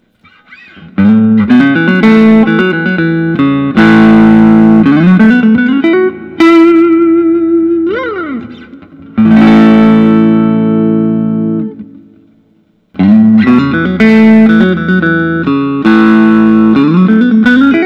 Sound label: collings 290 single cut; faded crimson